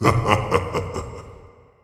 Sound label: noise laugh; Haunter